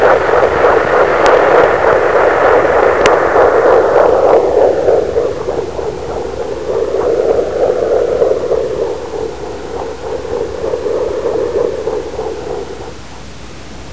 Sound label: 1st heartbeat